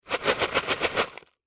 Nail Box Shake Fast Hard; Hardware; Industry; Machines

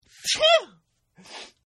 Sneeze; Sneezing; Human; Partners In Rhyme

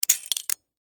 Steampunk Latch, Scifi, Steampunk, Latch; Science, Fiction

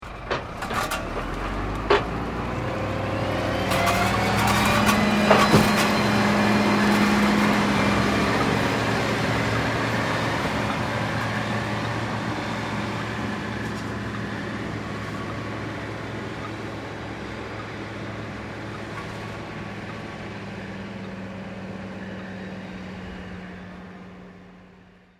Tractor Earthmover Maneauvering Operation; Tractors (Sound Effects:Vehicles)

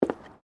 FOOTSTEPS WOMENS LOW BOOTS BOARDWALK STEP; Footstep Foley; Footsteps (Sound Effects:Human)

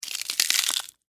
Flesh Rip; Horror; Blood and Guts

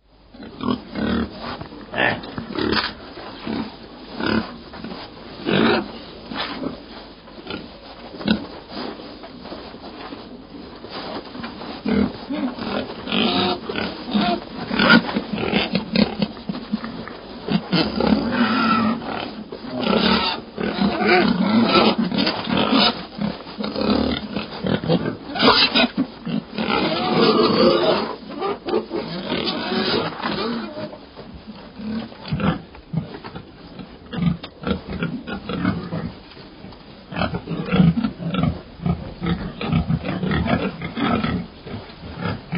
Pig; Pig Sound Effects; Animals

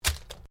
Organic stab; Various Organic Foley sounds; Stab And Slice; Combat; Stabs and Slices

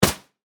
Sand Bag Drop, On, Ground; BlastWave

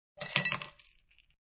Ice Dump, Ice cube dumped into glass.; Bars

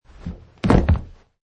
Landing

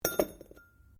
METAL ON STONE IMPACT GENTLE; Metal (Sound Effects:Materials)

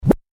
Record Dj Scratch Spin; Record Dj Scratch Spin Short; Dj Sound Effects

